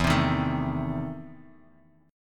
E Major 7th Suspended 4th Sharp 5th; EM7sus4#5 strummed

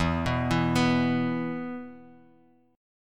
E 5th, Bass Standard; arpeggiated; JGuitar

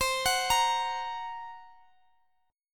Gb Major Flat 5th, C

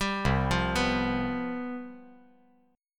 C Major 7th Suspended 4th, down

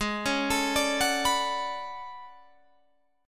A Major 13th, guitar

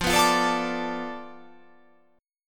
F 6th Flat 5th F down, chord

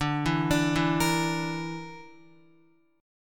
D Suspended 2nd Sharp 5th D Guitar; arpeggiated; chordshape

